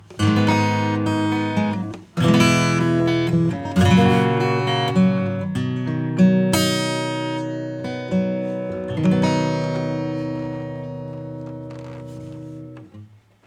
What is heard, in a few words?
acoustic EQ; Pink4